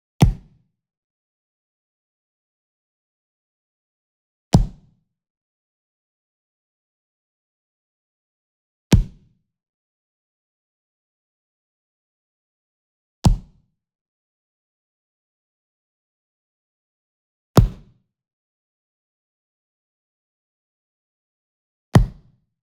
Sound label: Foot Stomp Near; SWEETENER; boom library; Cinematic Strikes Construction Kit